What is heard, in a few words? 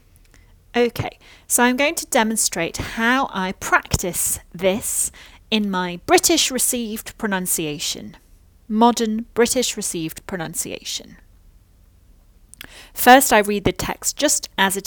Singing; Arthur The Rat; accent training